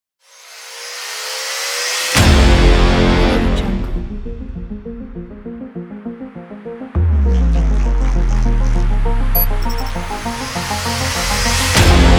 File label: cyber punk